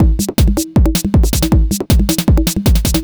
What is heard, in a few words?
Dance Drumloop; Drums; Dance Beats